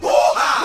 woo ha group of men; ooh ahh; ho hoo woo

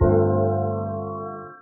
muted, Horns; Notes

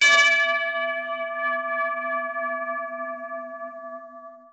medium sized, Bells; Misc Percussion

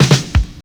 Drum loops, Rolls

